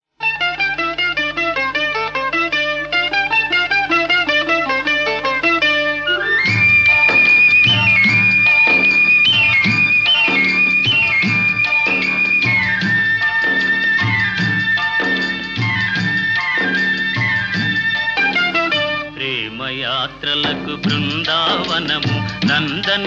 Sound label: telugu old, ringtones classical